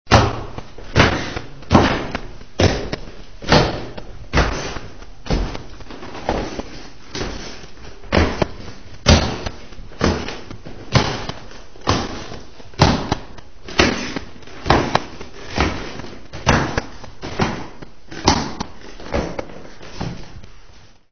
stairs

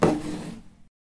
small scrape

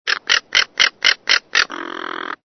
BIRD CONDOR

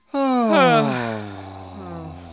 people

